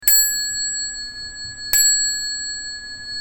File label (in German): Fahrradklingel Classic Messing mini